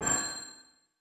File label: Boss Chain Blink; helltaker